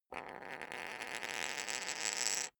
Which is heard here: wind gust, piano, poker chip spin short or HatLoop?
poker chip spin short